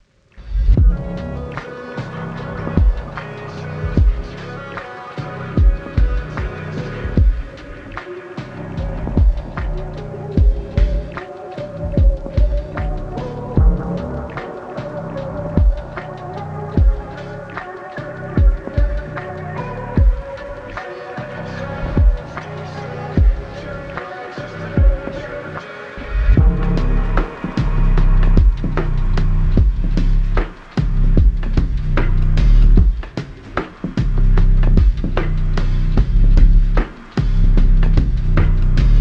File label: Gaming Soundscape